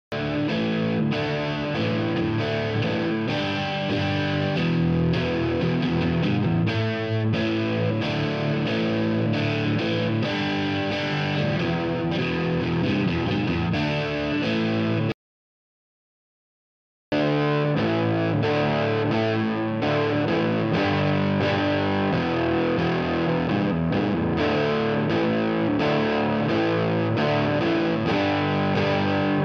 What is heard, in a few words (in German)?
Speaker Rechts SM57; Aufnahmevergleich